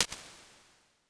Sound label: Hall, Impulse Responses; 1.8s; Halls; Apple; Midnight Hall; Medium Spaces